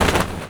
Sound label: Trench Netting Destroy; Gameplay; Warframe; Tau; Destructible Dynamic